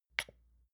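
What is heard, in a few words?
lotion large lid open soft air, hairdresser; berufe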